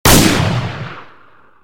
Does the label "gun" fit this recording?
Yes